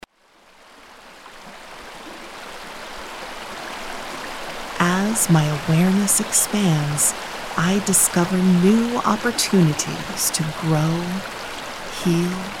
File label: Nature